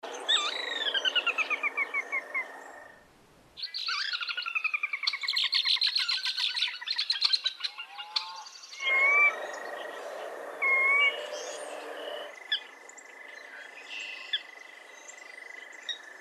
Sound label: RAIL SORA; species/birds; wbba